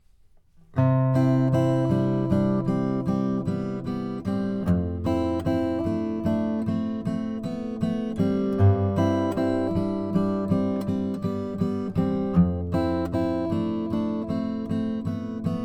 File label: taylor custom tf brazilian rosewood